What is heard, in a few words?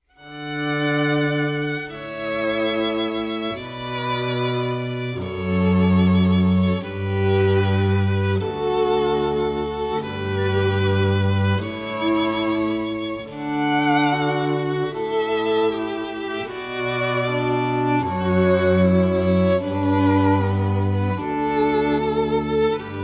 String Trio; Pachelbel Canon